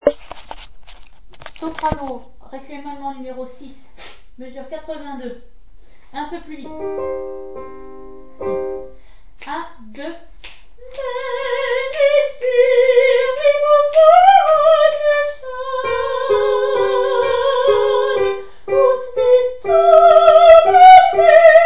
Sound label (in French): Brahms requiem allemand soprano mesure 082-104 plus vite; mesures 82 à 104 plus vite